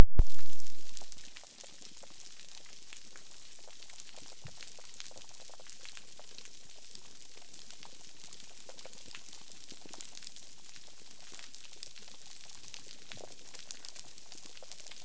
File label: snapping shrimp noises